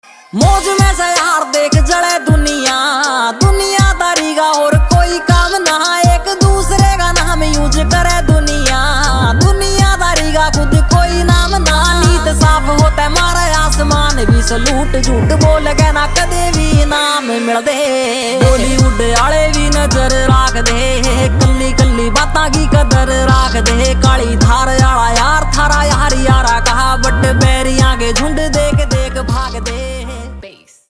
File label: Bollywood, ringtone